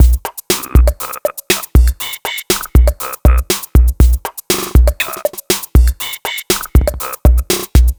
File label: Mono Vocal Beat, Drum Loop; Sonic Foundry; The Electronic Music Manuscript Collection; Drum Loop Structures